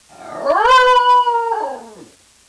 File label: yodel, basenji; Natures Masterpiece